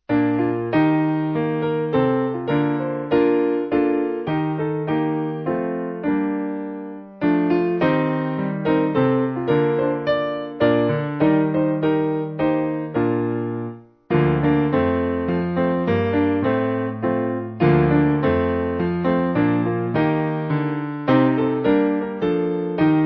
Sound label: Piano; Thaxted